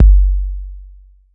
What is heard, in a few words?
BD 808